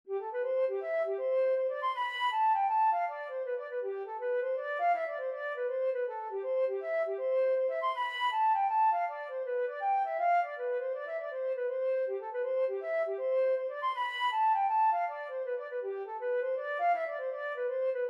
hornpipe flute

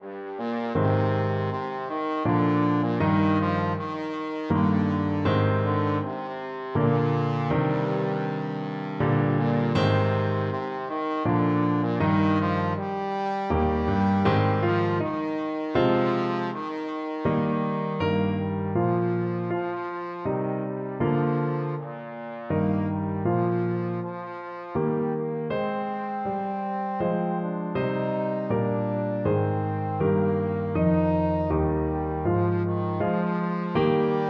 trombone

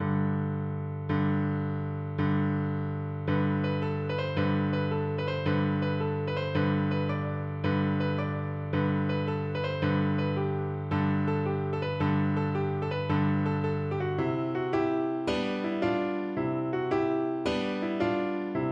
piano

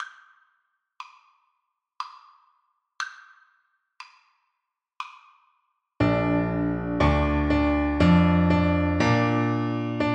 minuet from don giovanni, Saxophone

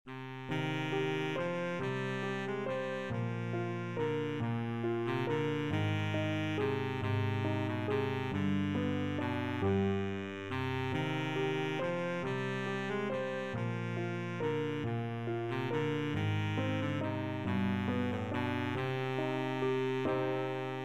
baritone saxophone; greensleeves

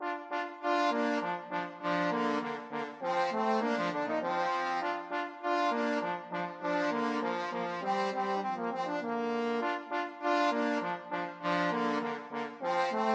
trombone duet

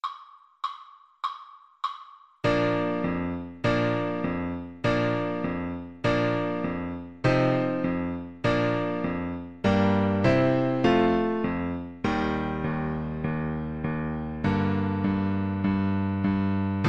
bizet carmen toreador flute

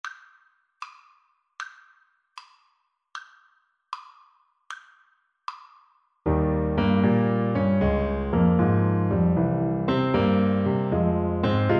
hora fetelor, Trombone

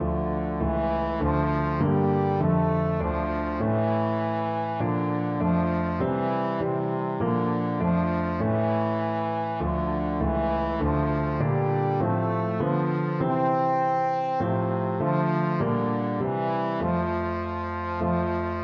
trombone

